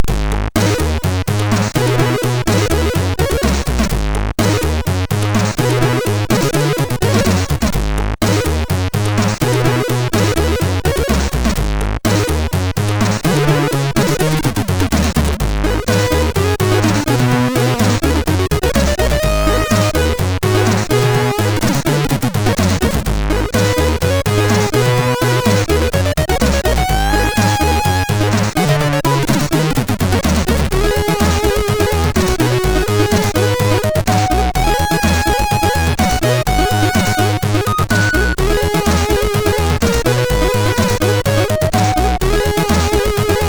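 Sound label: Funky bass, Commodore, MOS6581; MOS6581R3; hvsc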